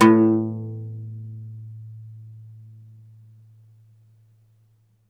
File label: OUD A1 -L; E-MU Producer Series Vol. 7 – Old World Instruments